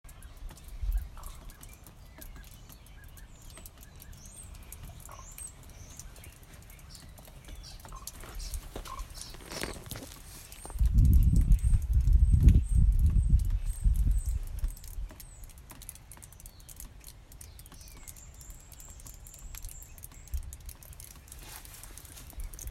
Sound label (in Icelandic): Umhverfishljod